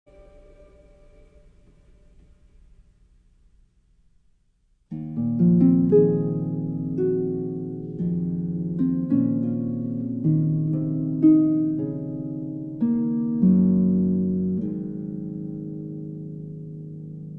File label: Harp Concerto Lullaby, Philharmonic Orchestra